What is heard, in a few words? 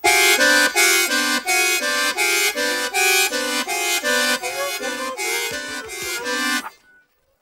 Noisy Accordian; pingu the original soundtrack